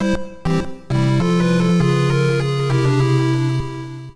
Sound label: Game Over Jingle; mario tennis open; Special Game - Super Mario Tennis